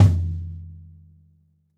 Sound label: bop kick - snares on; JazzFunkKit